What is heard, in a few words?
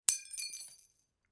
light bulb glass shatter; collanderson